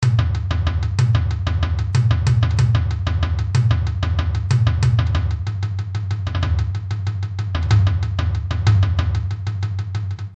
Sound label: Some Toms